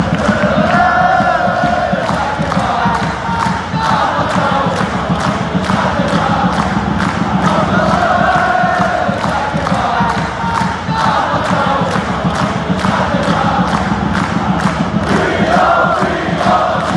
player chant; agyeman falkirk

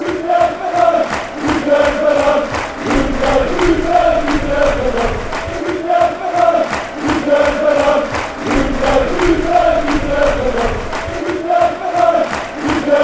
out fan chants; hubert

